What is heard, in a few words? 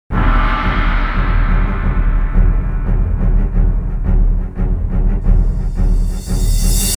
Boss Battle Theme - Intro; Tidal Wave